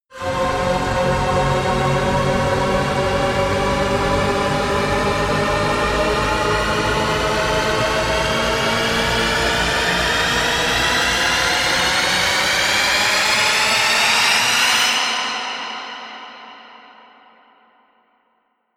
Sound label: rise long climb